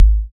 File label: BD, Synth; Sound & Vision - Gigapack II CD 2